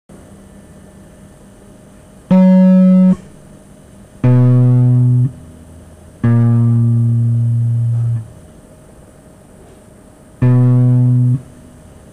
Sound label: guitar